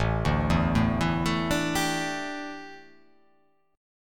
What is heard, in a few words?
A 7th Suspended 4th, A, down